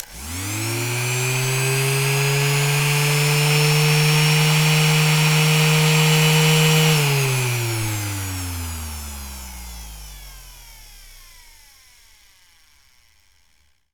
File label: vacuum